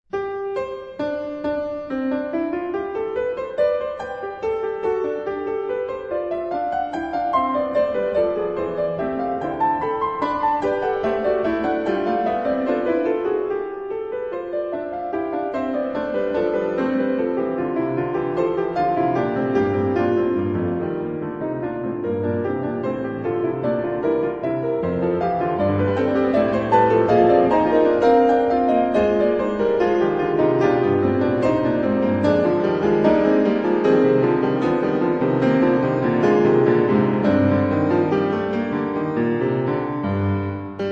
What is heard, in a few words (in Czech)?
Fuga Fis dur klavirni verze